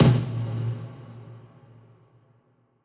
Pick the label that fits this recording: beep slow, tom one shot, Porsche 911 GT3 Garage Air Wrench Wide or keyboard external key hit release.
tom one shot